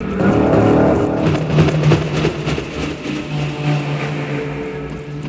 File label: Ambient FX, Ambience FX